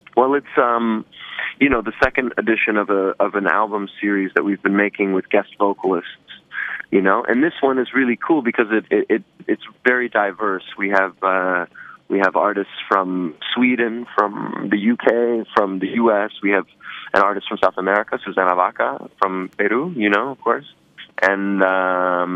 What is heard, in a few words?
disco